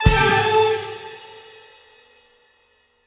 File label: Disco Stinger Stab